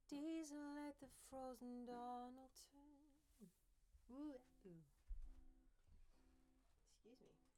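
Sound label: Acoustic Guitar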